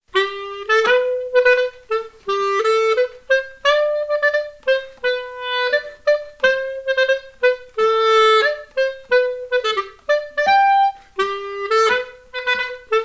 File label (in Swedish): marsch